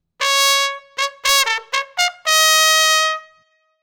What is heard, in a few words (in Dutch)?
klaroen